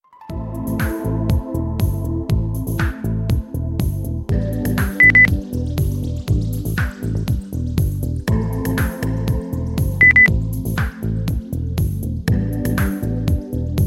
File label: lounge